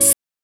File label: Hat; 音MAD; 转生成为了只有乙女游戏破灭Flag的邪恶大小姐